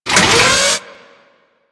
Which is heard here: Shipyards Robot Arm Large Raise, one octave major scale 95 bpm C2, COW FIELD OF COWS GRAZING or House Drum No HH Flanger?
Shipyards Robot Arm Large Raise